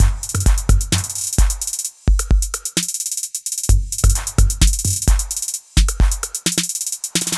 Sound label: 130 Trap808 Full; Touch Loops; LA Beat Scene MiniPack